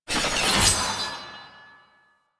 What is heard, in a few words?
Backstab Start Cast; Warframe; Warframes; Pax Duviricus